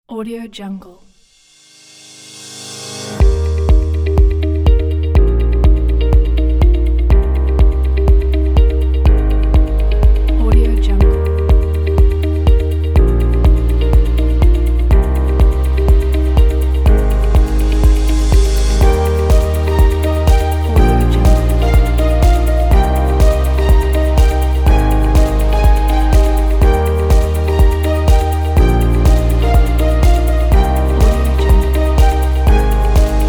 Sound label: upbeat background